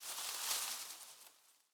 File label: leaf rustle crunchy hard